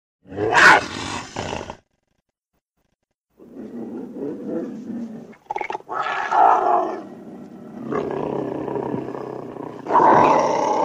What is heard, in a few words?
Cougar Sounds